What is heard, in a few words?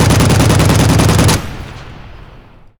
plane; World of Tanks